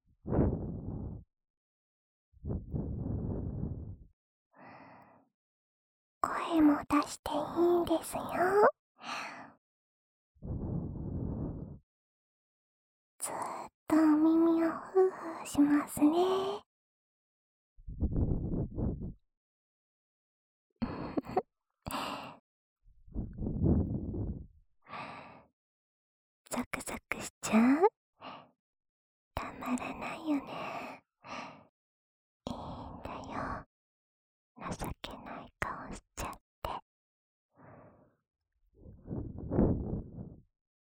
吹耳朵（声道翻转）

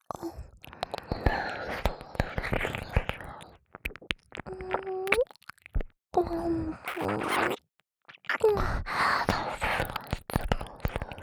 右耳亲吻舔耳; 自慰辅助特典音声; 舔耳吻耳

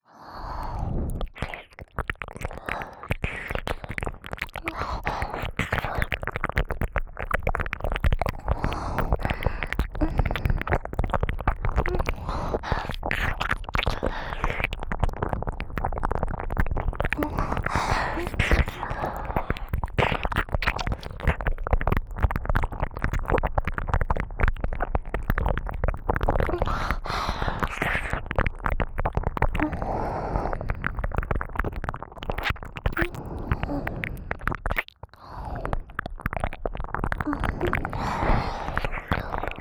兩耳舔拭; 自慰輔助用特典音聲; 靠近耳邊

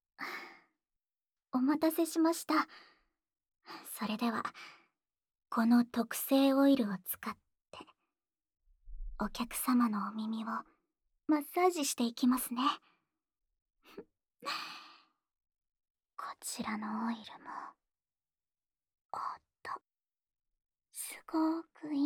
耳朵的精油按摩 无人声, ASMR

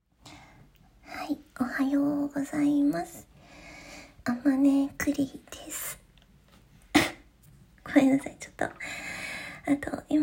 起床尿; G-Sound

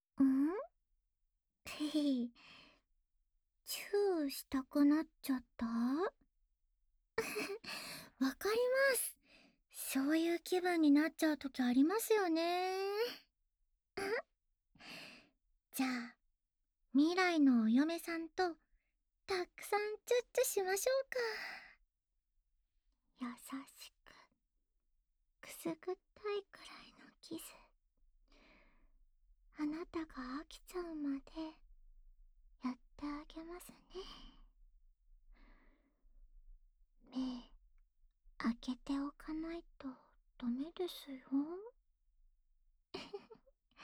安眠用 温柔的接吻, ASMR

